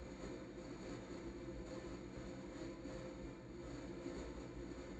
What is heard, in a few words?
ui player unit swap; Shelter